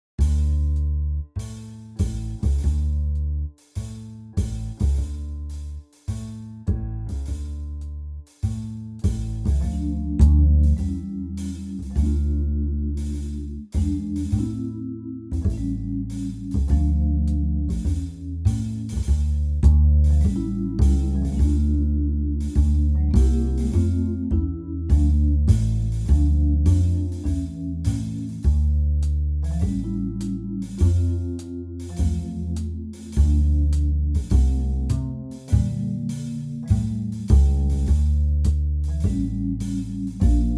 komp, 102 bpm

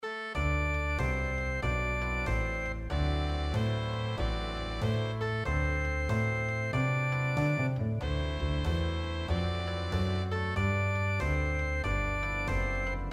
Jens blues

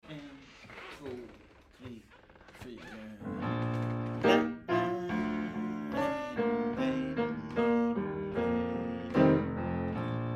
pianokomp

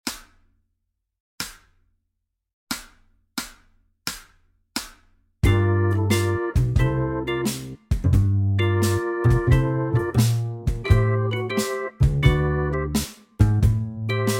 syntkomp

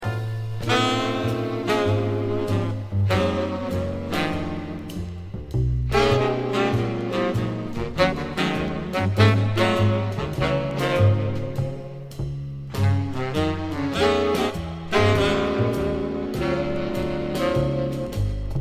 saxchorus

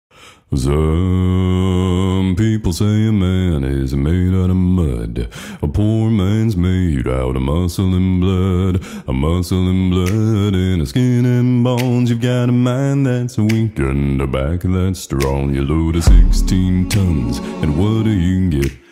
låg sång

